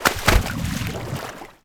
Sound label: Splash Small